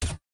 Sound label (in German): waffen schuss; minecraft